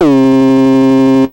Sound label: drone, synths, dancerap; The Sample Bank